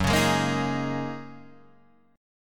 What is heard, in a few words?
Gb 6th Add 9th Gb down Guitar Open D